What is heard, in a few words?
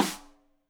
Rim Shot Stereo; Deeply Sampled Drums; SMDrum